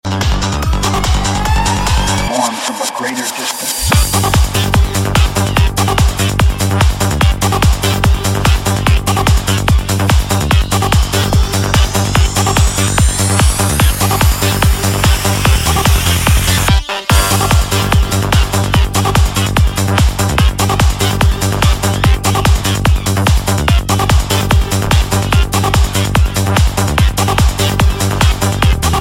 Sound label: Very Hard Trance